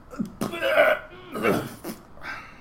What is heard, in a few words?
throwing up